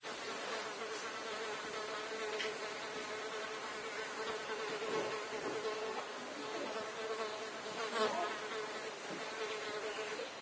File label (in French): mouche, ambience